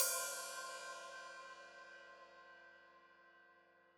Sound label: Hat Open, Hi-Hat; Deeply Sampled Drums